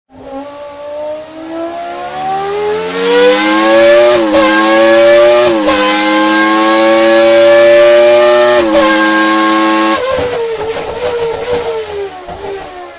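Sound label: formula 1 renault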